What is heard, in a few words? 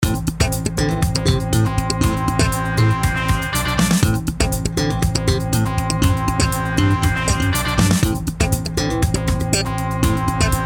FUNK BASS